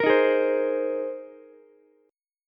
A sharp 7th Suspended 2nd Suspended 4th, Ukulele chord; strummed; 15,15,16,15; JGuitar